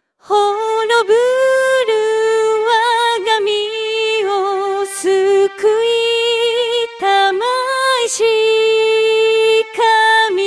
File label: soprano; easter